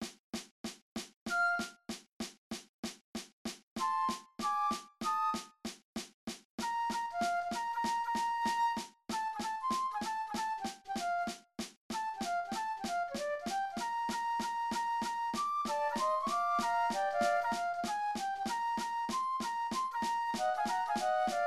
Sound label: Just a Closer Walk (cut time) flutes; senior high band